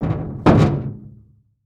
Foley Footstep Work Boots Metal Single Step; Foley Footsteps

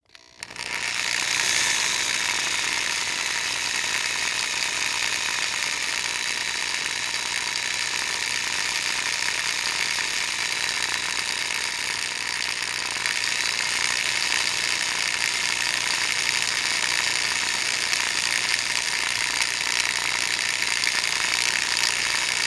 Industry Tool Drill Power Drill Spinning Slowly

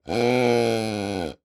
Sports Buck Caller Call Short

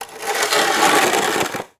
Weather Snow Shovel Drag On Driveway; AdobeCC

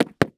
Foley Footstep Golf Cleats Jump On Cement; Foley Footsteps

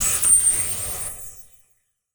Transition Whoosh Warble

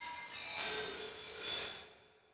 Title Transition Digital; Adobe; Production Element

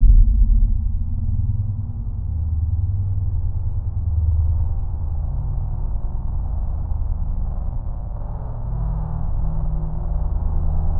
Deep Drone; Cinematic Trailer Music; Bonus - FX & Transitions; BlueFX

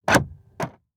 Auto Saturn Door Lock Interior; Transportation

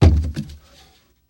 Hand Grab Wooden Gate; Foley

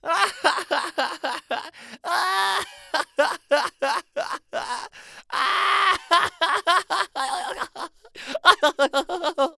Human Male Laugh Boy Laugh Voice Long

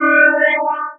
Multimedia Internet CD-Rom Flash Hi-Tech

